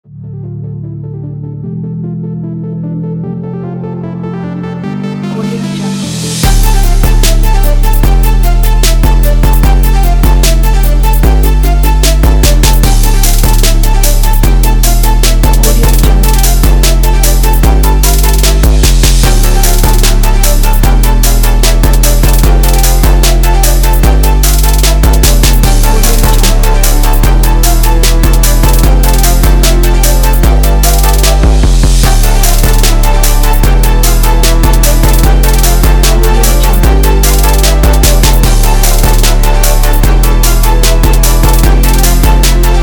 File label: trap energy